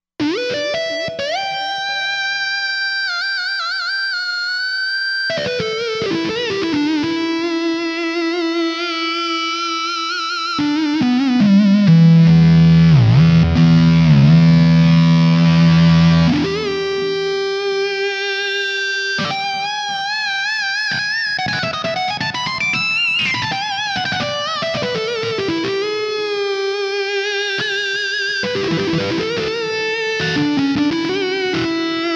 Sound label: Searing COSM, Roland Fantom XR